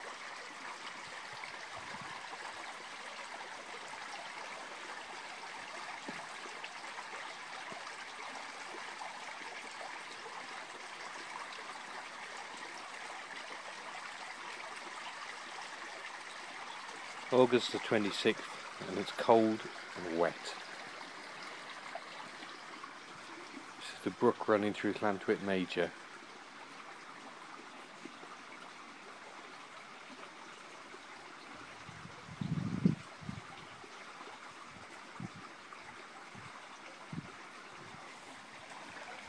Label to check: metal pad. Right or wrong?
Wrong